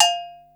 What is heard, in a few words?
agogo lo, Yamaha Motif ES drum machine; 300 Drum Machines; Yamaha Motif ES-Series